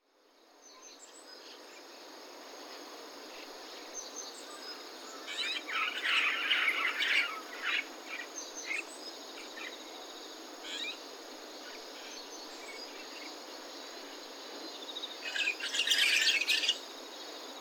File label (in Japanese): コムクドリ; 第一調節池